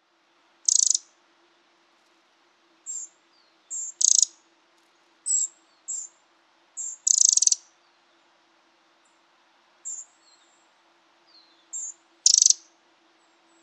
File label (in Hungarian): okorszem Troglodytes troglodytes